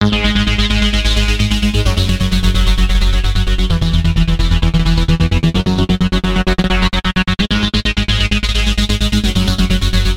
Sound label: Trance Lead With Gate Effect